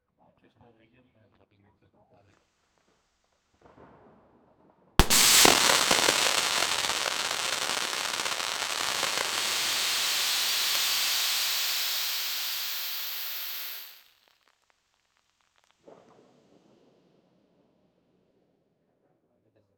lighting a fuse sending a, fireworks

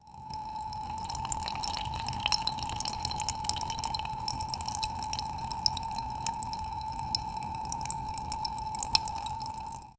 muffled water softly runn; increasing intensity

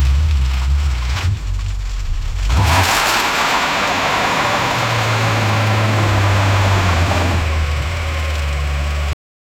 sound of a high powered off road

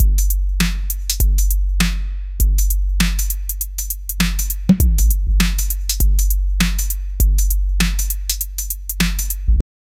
tense beat

